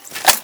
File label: remove box; reapr; tacz; RFP v1.0.0 beta2